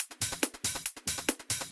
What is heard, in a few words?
Loop 140BPM, Drums & Perc; Vengeance Samples; Incast Kit; E minor